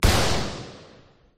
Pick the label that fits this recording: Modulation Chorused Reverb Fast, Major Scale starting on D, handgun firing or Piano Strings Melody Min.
handgun firing